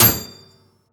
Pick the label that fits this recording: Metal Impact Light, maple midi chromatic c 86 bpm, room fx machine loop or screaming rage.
Metal Impact Light